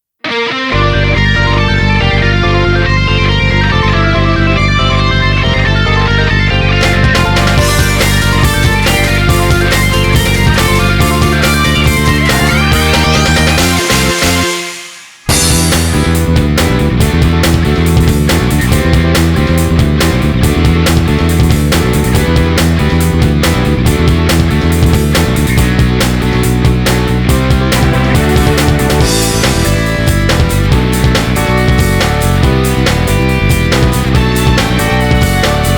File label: instrumental